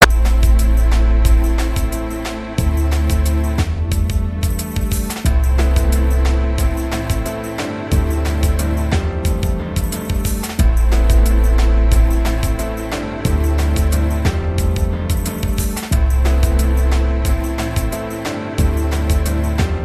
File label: Smooth Grove